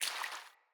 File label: Fawn Footstep Water; Foley